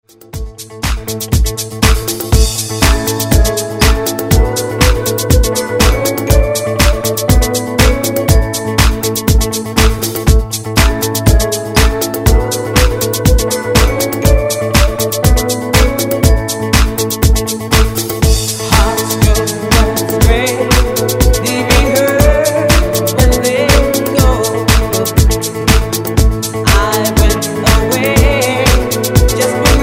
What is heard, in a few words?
70s